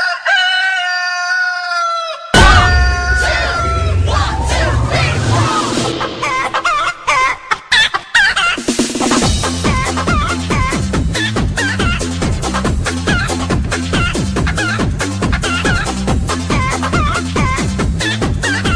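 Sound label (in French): Réveil coq remix, sonnerie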